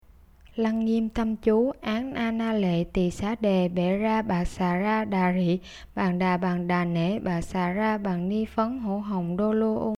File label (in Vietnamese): Lăng Nghiêm tâm chú